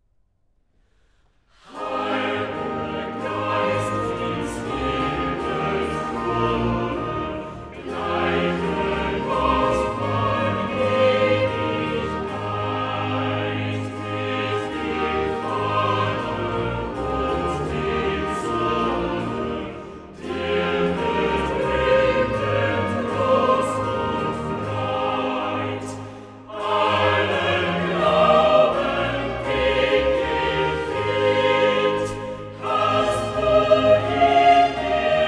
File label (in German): Choral, cantatas; BACH VOCAL; BWV 194; Hochsterwunschtes Freudenfest; Heil'ger Geist ins Himmels Throne